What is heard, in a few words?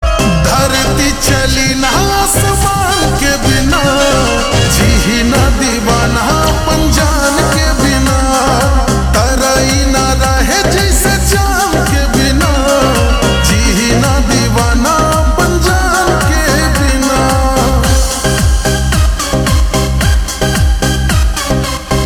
Slow Reverb Version Ringtone